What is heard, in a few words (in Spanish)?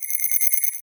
efecto joya moneda light